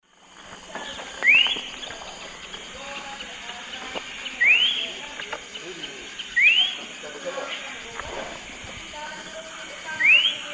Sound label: Icterus croconotus, call and song; S. America; Eastern Lowlands